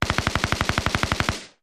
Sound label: Gunshots Machine; fires